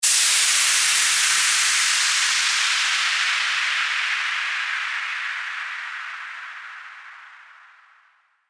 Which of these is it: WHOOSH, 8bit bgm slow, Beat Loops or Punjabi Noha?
WHOOSH